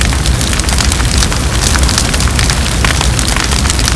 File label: huge fire